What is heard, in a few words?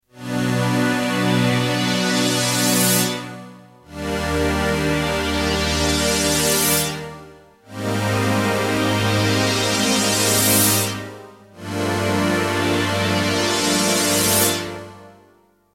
saw it up